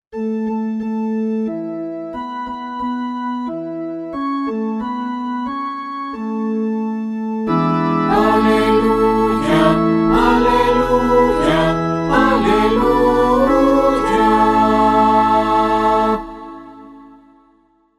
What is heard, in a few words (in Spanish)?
Aleluya